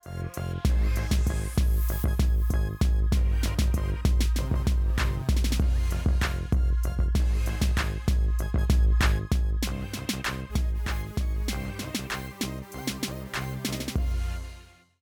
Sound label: Music; NewsOneNow